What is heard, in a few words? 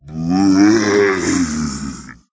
Lost City Groan